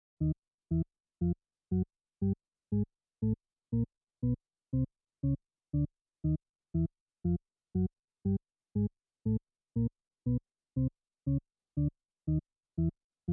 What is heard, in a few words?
shepard circular scale